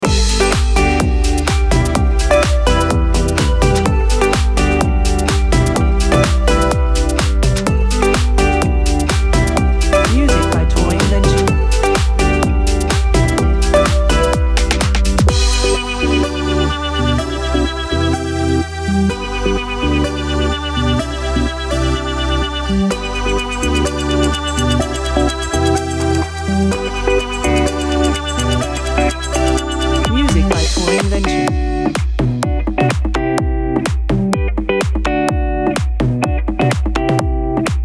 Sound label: Summer Radio Pop loop